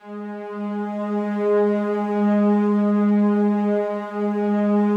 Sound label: SLOW STRINGS 2 G#2 R, Yamaha VP-1; 90 s Sample CDs; Music Store